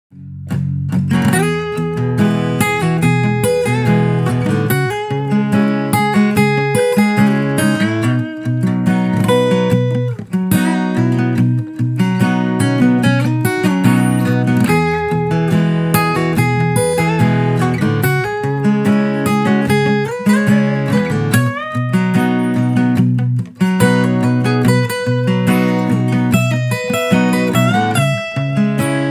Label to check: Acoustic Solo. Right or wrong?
Right